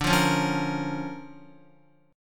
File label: D Minor Major 7th Sharp 5th, D, Guitar; x,5,5,6,5,6; DmM7#5 strummed; JGuitar